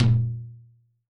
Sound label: Tom, drum